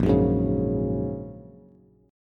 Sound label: A sharp Minor 7th Sharp 5th, Bass; strummed; 6,9,6,6; JGuitar